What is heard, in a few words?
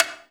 STICKS; Percussion; InVision Interactive; Percussion Slam